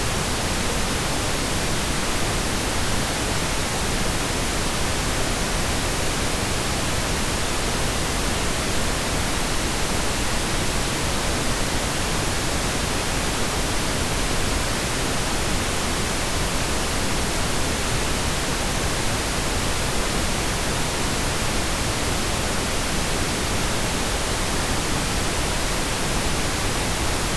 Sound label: Pink unfiltered; Pink 0vu unfiltered 48k 24bit DScope